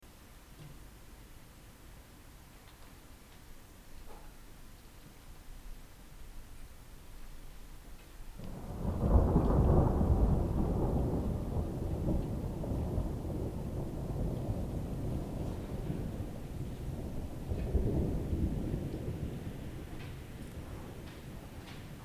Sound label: rain thunder steps